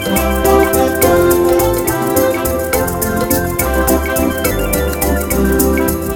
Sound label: Happy Smooth Jazz